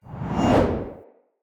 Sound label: Long Sword Accent; Weapons; Mother